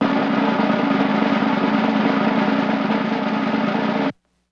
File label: LoFi Drum Roll; Vintage Cartoon; Drums & Percussion